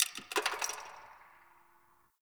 Ice Crack One Off

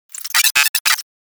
space drone pain; Enemies; Warframe; Corpus Space Drone

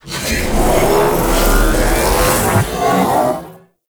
Void Dragon BFG Beam Charge; Enemies; Abilities; Warframe; Duviri

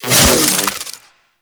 Parazon Hack Out

